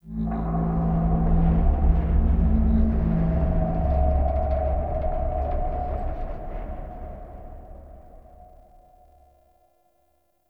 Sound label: Lfe Metallic Boom; Warframe; Ambience; Entrati; Dante Unbound Leverian